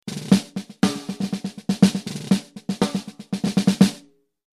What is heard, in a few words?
porkpie; green forks